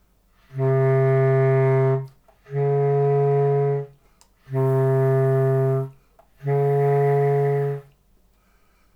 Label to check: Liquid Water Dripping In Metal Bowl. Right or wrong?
Wrong